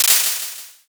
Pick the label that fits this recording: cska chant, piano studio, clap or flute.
clap